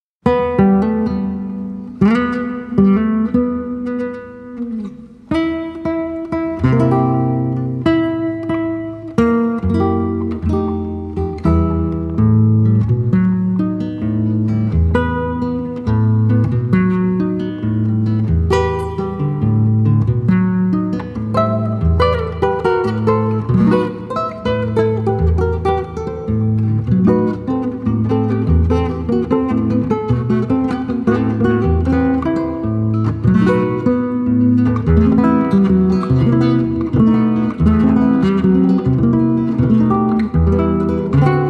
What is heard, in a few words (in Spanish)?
guitarra; ギター作品